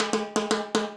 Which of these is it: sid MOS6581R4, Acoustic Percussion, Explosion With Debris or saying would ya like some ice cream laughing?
Acoustic Percussion